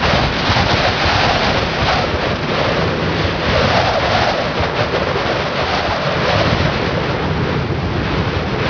windy

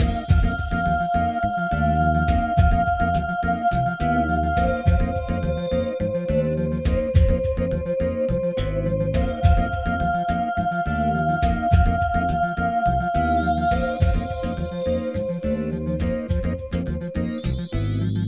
shopping music; projectmobius

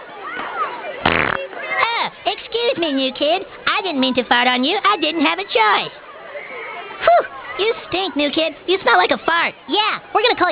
fart boy

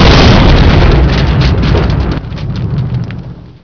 big explode; svencoop; adamr

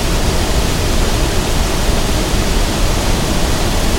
waterfall; firearms; canyon

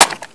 M1 clip in, weapons; dod

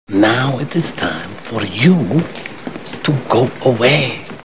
windmill; tfc

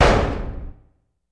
bang; svencoop

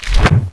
claw strike; svencoop; cryocell

